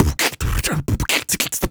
Human Beat Box, 140; Loopmasters

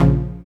TECHNO F2, dubstep; Jack Sparrow Deep Dubstep Progressions

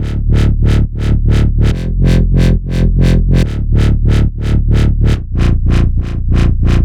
140 D BASSLINE, Dubstep; Loopmasters; The New Sound Of Dubstep; BASS LOOPS

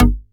Synth Shot G#; Mutekki Media; Vengeance Electroshock Vol.2

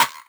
Percussive

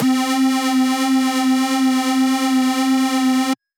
Dirty Dutch Lead C3

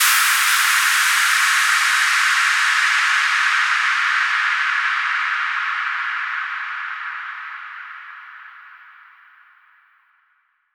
FX Impact

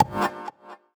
G STAB, funky

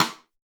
Rimshot, Dubstep One-Shot Drums

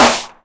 Claps & Snares; Mutekki Media; Vengeance Electroshock Vol.2

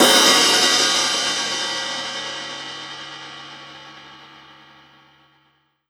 Crash, Cymbals

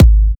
Bassdrum

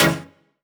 Synth Shot C#; Mutekki Media; Vengeance Electroshock Vol.2

